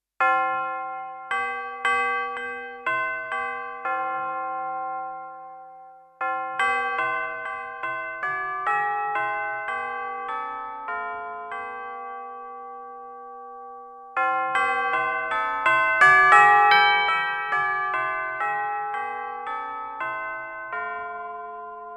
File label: Roehrenglocken Tubular Bells